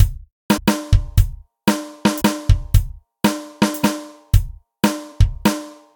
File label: drums